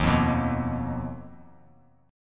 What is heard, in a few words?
D sharp Suspended 2nd Sharp 5th D sharp